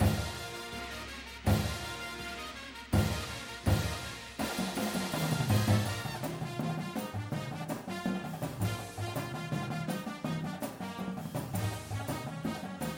full drumline w music

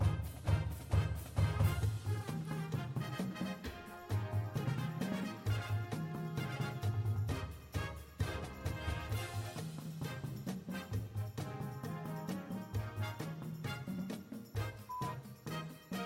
bass w music

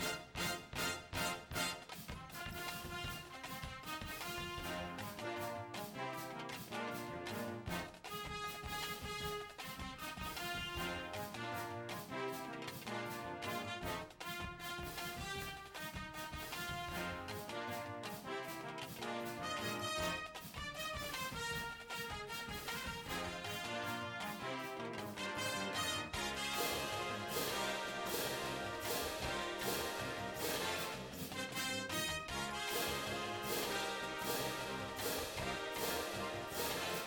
cymbals w music, drumline

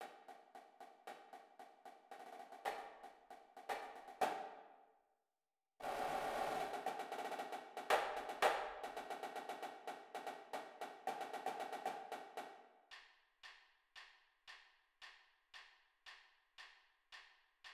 snare; cadence

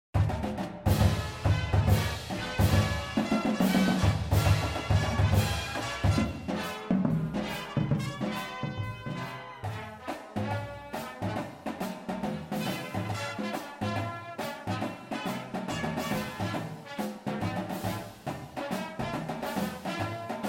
full drumline with music

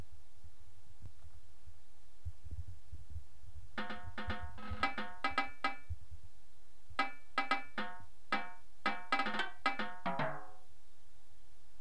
tenors, drumline

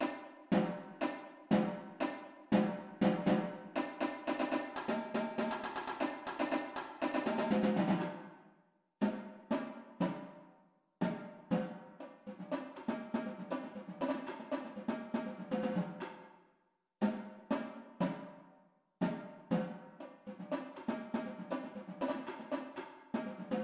tenors

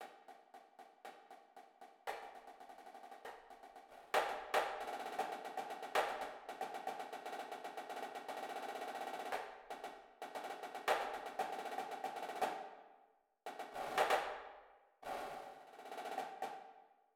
snare, drumline

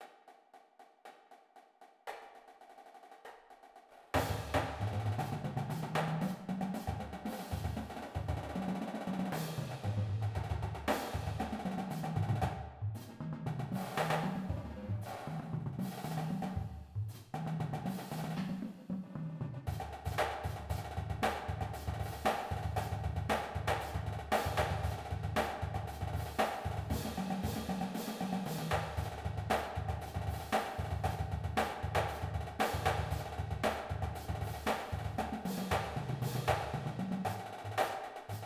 full drumline

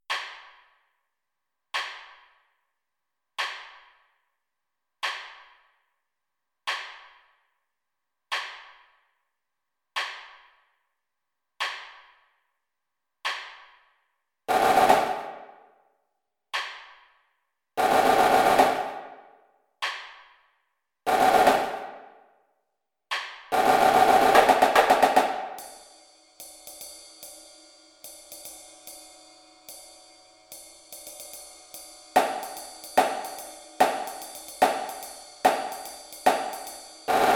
snare

